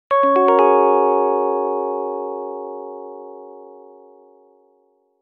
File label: sms, ringtones; star tours; Disney